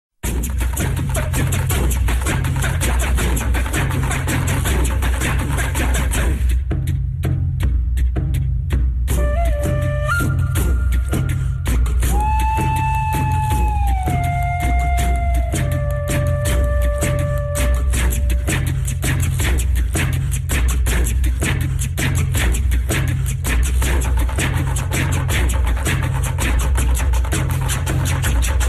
beautiful instrumental combination ringtone

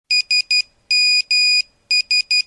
Nokia bip bip ringtone

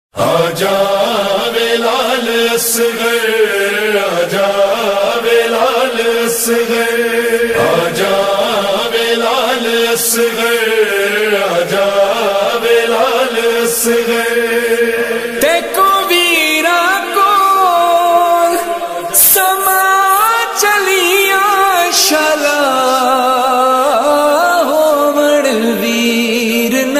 noha tune ringtone

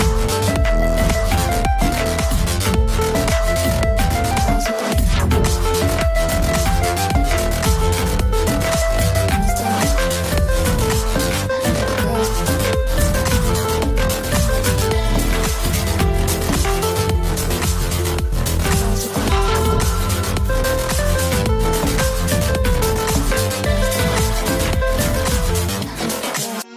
hindi love music ringtone, bollywood; bollywood ringtones

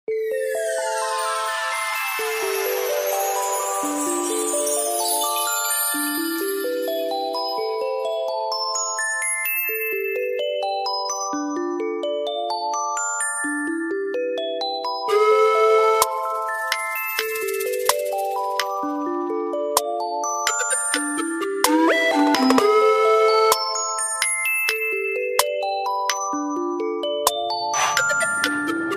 remix ringtone

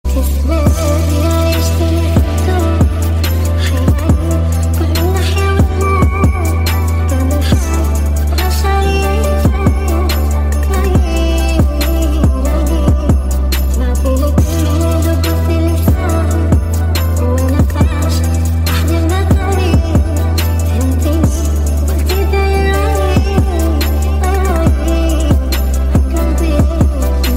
arabic music tone ringtone

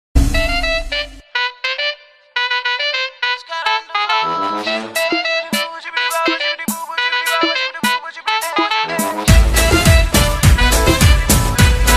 sad hindi ringtone, bollywood

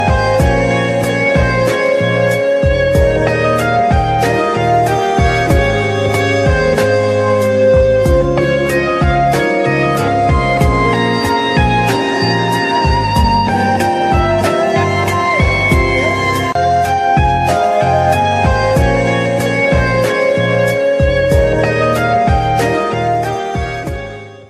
love romantic ringtone, bollywood ringtones

